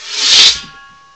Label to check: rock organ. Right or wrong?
Wrong